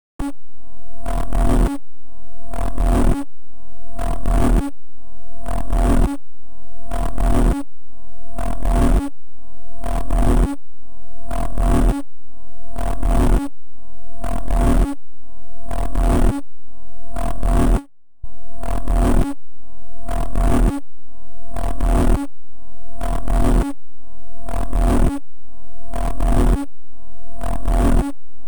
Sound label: glitch synth